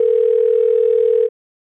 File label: telephone interior; Counter Strike Model Pack; CS:GO; survival